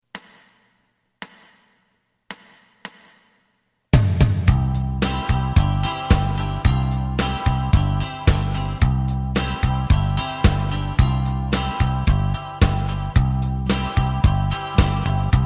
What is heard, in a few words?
lead